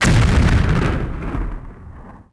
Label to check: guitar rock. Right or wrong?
Wrong